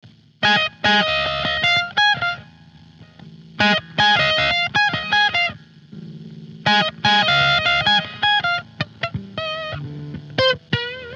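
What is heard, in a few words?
F 77 Midwinter guitar impro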